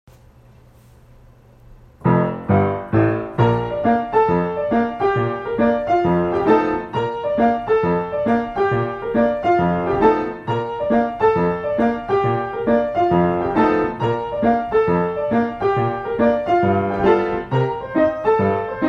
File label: alto audition accompaniment at tempo